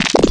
Resonate, Growl Wavetables; Cymatics; Virus Wavetables; Serum

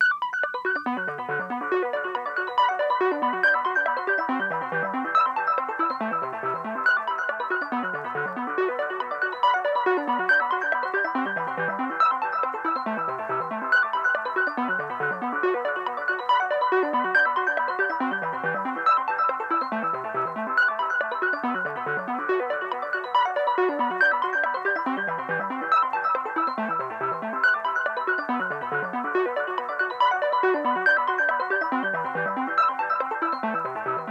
140 BPM B Min Arp; Cymatics; Destiny Gold Expansion Stems; Analog Nightmare

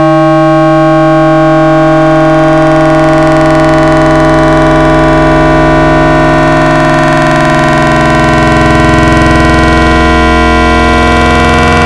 wavetables; Cymatics; Essentials Wavetables; Serum

